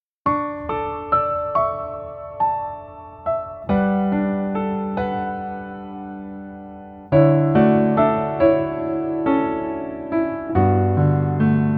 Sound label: Piano ver